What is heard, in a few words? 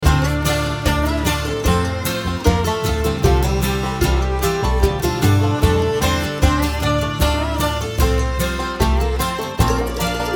fiddle